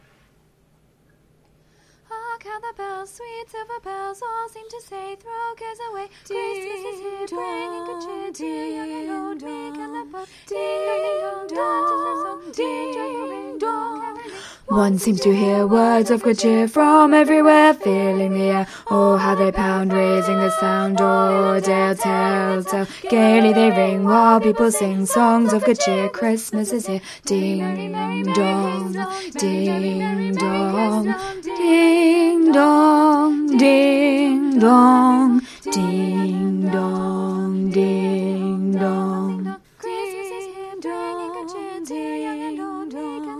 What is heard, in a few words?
bass